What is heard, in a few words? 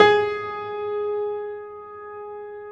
PED FF, GRAND PED; Best Service Advanced Media Trax Modern Composer